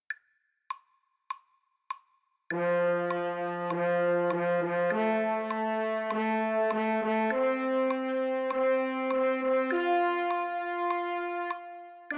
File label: french horn fanfare